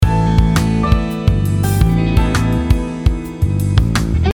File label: main melodic fragment